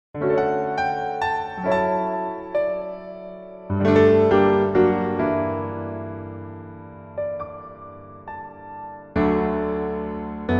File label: Piano MR